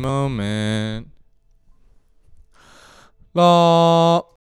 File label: Male Ambient Lead Voc